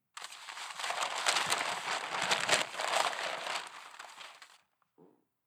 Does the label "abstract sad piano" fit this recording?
No